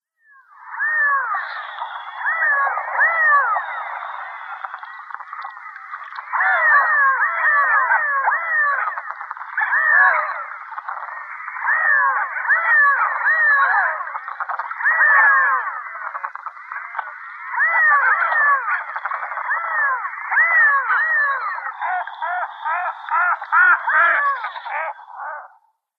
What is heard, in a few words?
Redhead